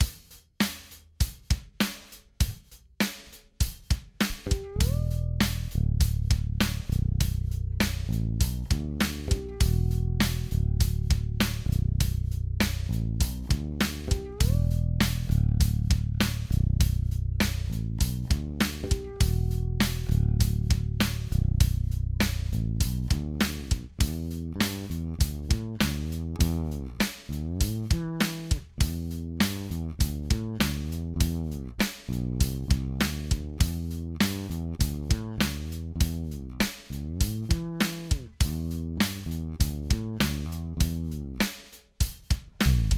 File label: fretless